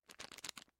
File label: shake